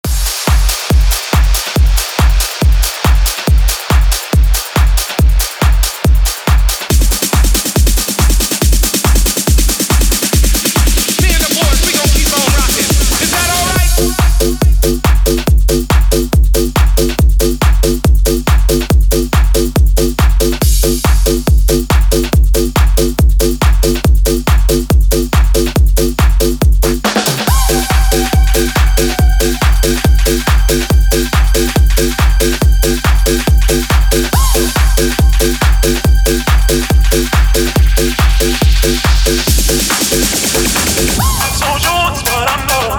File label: RUMBLE